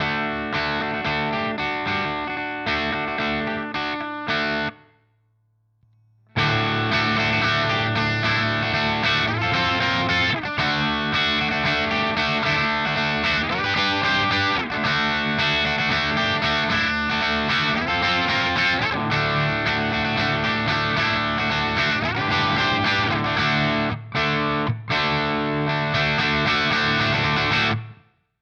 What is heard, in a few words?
Vox Valvenergy Silk Drive; STD-1